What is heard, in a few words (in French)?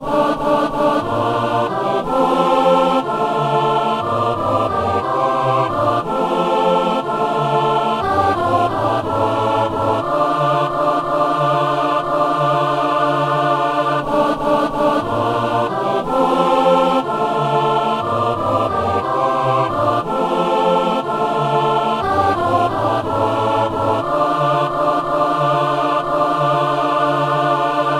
messe simple gloria; Messe du 17 janvier à Saint-Denis